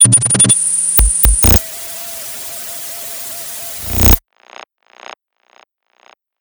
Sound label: Sucking Tube; Plastic Surgery